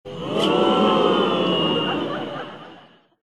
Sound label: WOOoooo